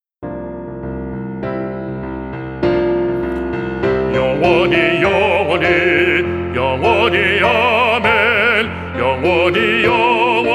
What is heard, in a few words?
BASS